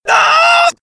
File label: backstab; freak fortress 2; some clean trash